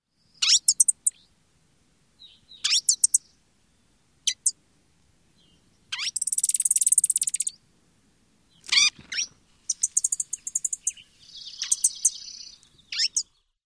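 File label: Green-tailed Towhee, calls number 1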